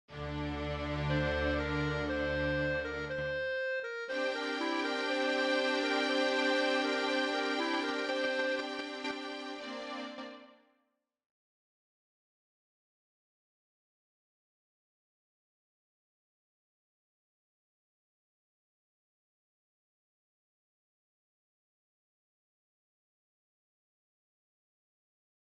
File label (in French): clarinette